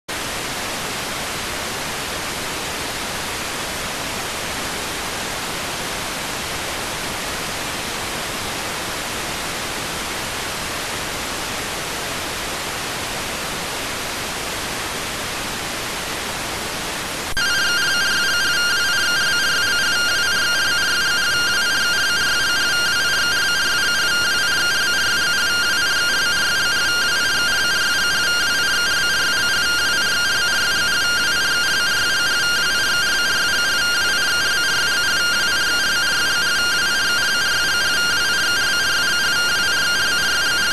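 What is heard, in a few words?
radioteletype receive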